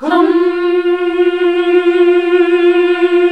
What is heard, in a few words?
HMM F#2; Best Service Gigapack 1